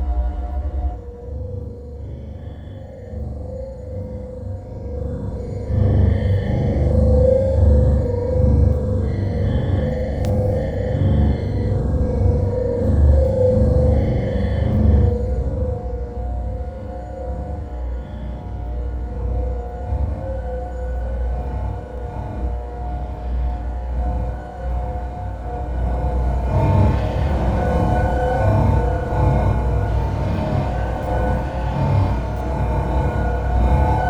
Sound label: idle music; itsteals; legs; slender